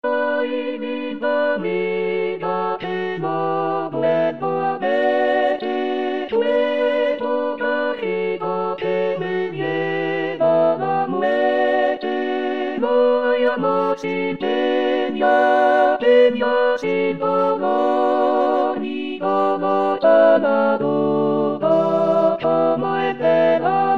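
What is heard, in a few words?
alto; Ay Linda Amiga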